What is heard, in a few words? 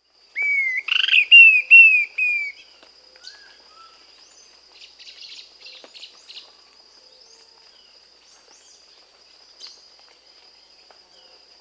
Dusky-tailed Flatbill (Ramphotrigon fuscicauda), Bolivia, Peru; S. America